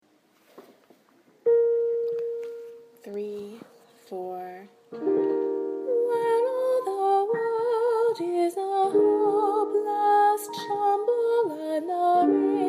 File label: alto